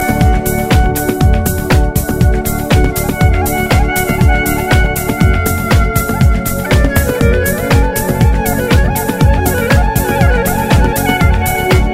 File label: Georgian Flute